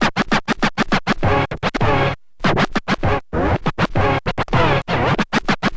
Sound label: scratch med, Vinyl Attack scratches mix